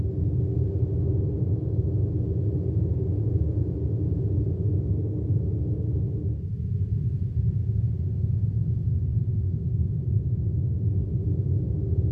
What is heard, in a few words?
Ambiance Menu; the pit game design